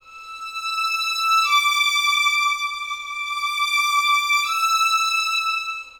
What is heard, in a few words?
Vlns DnB Em 160bpm